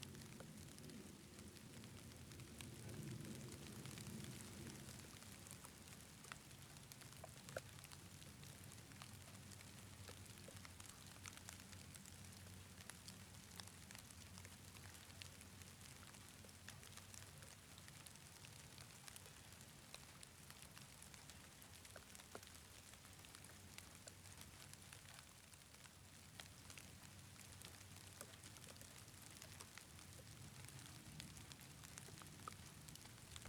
sunshower drizzle; calendaria

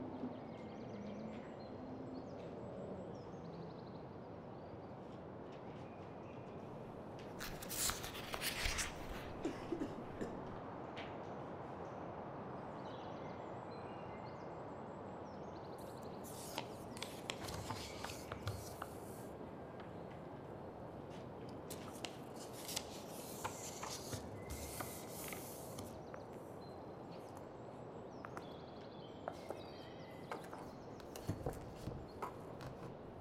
observatory, ambience; pf2e; hells destiny

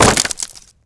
wood break; fa battlemaps

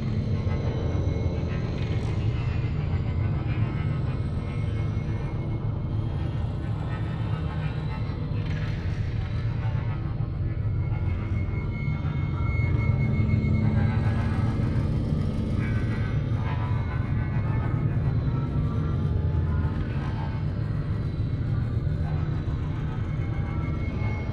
ambience; sf2e guilt of the grave world

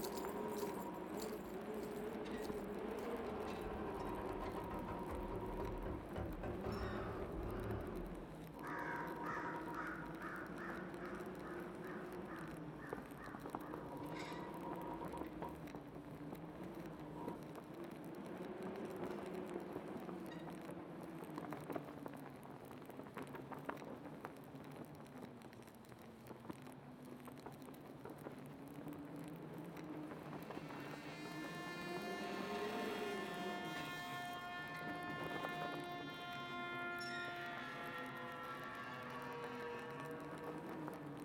nighttime, ambience; pf2e; hellbreakers

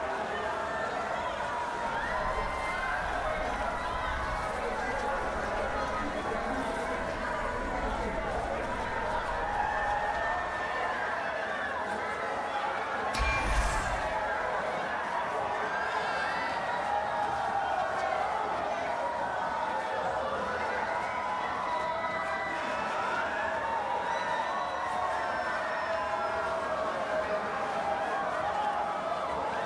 gala crowd, ambience; pf2e; hellbreakers

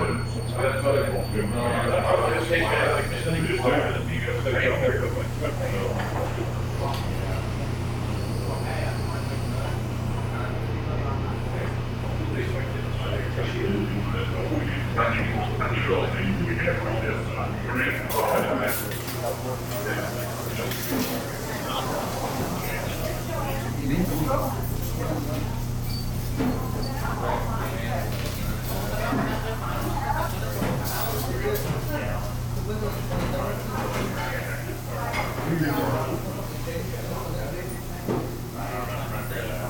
analog cafe; sf2e; murder in metal city

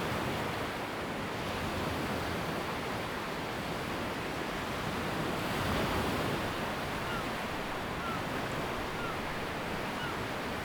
ocean

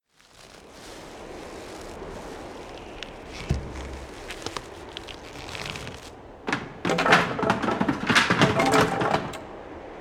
Falling Bridge; pf2e; the seventh arch

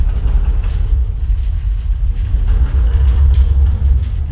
metal heavy creaks; fa battlemaps

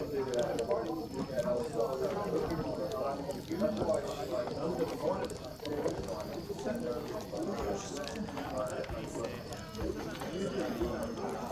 caravanserai, ambience; pf2e; hellbreakers

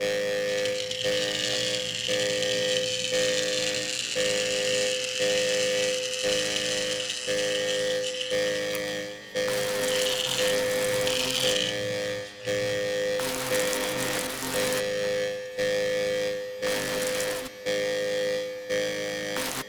cascade faults, loop

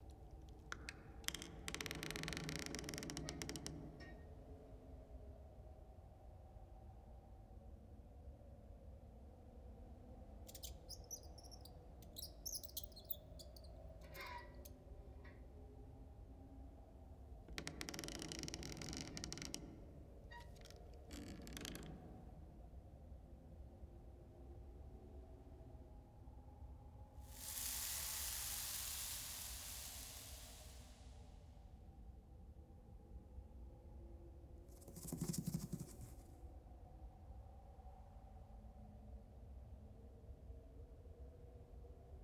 safe house, ambience; pf2e; hells destiny

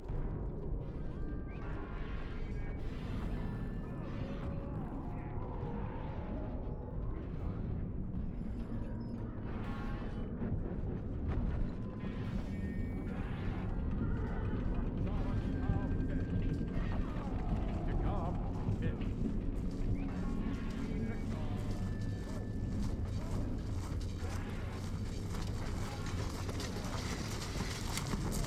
military camp, ambience; pf2e ap223 hells destiny; andoran

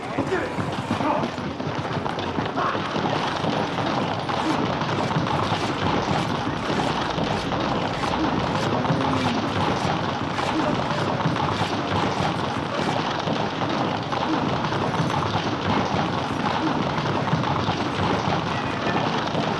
under attack

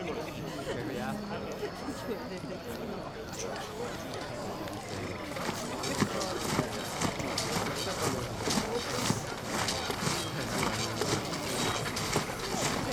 post battle, ambience; saringallow; pf2e; hellbreakers

